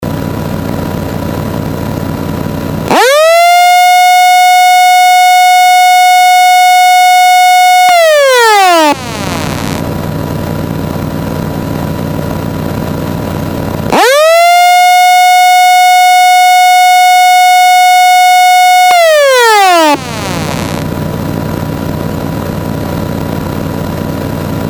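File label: tsunami warning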